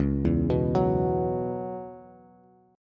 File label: Db Minor 11th Db down Bass Bass Standard; arpeggiated